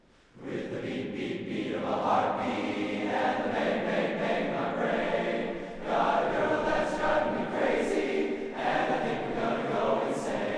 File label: mens honor choir